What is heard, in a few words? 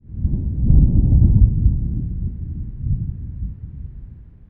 Thunder Atmos; 1944 Battle Of The Bulge